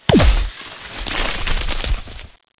wooden door; doors

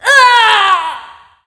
female death; ghostfury

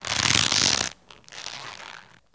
forge combine; arkane

